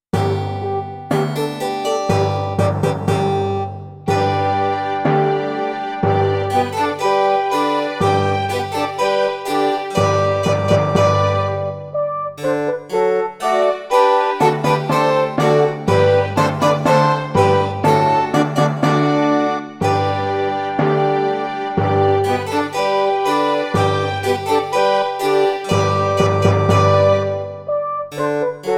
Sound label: March Duet Eb, Backing